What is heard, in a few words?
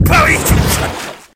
Viking shoot